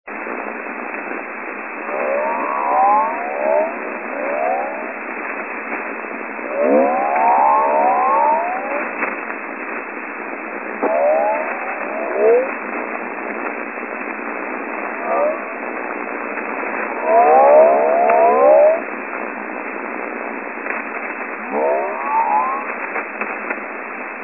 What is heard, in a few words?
Unid Whale Signal